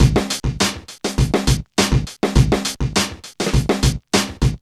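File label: 102 bpm, Funky loops; Zero-G; Funky Elements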